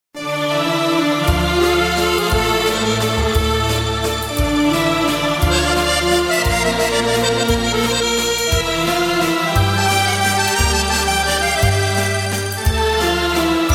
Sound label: Instrumental